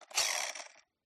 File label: toy car rc wheel grip spin manual; Skyclad Sound